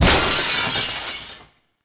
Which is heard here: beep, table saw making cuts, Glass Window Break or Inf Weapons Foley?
Glass Window Break